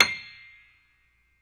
PNO Grand Pno; Club-50 - Foundations Roland

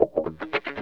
wah snip, Funk Gtr & Wah; PlatinumPhattCD2; E-MU Producer Series Vol. 8 – Platinum Phatt

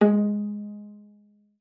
G#3, VIOLA PIZZ; Xsample VOL-5; 90 s Sample CDs

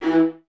String Ensembles, Violas

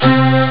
violin; Amiga Soundtracker Sample Packs

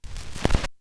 vinyl; Masterbits; Vinyl Attack

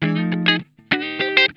SLICE, Funk Guitar; Zero G - Funk Guitar

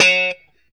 POP F#3, STRAT guitar

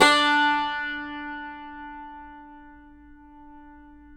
CUMBUS D, Guitars; Spectrasonics; Hans Zimmer Guitars VOL-2

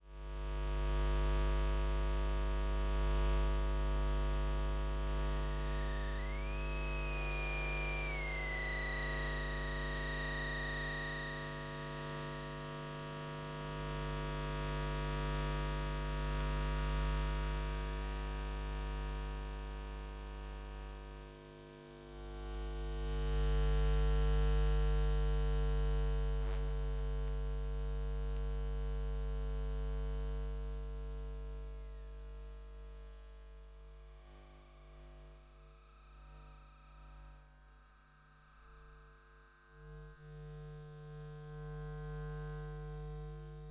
Electromagnetic Fields, Hummin; electric fields

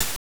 Hi-Hat, Genesis; Super Fantasy Zone; M8

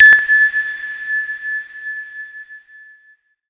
SHORT, SHORTNOIS; AKAI; USB Soundscan vol.14 - Special FX Twisted

